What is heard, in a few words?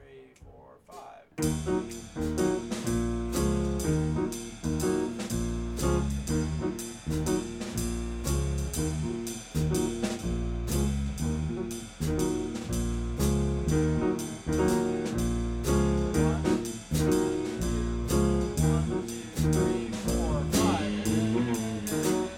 jazz